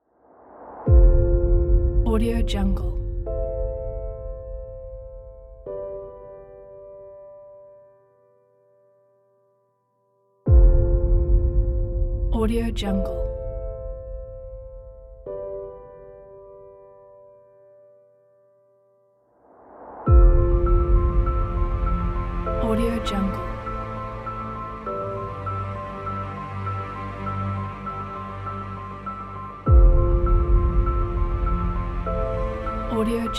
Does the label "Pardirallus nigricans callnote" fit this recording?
No